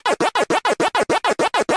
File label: move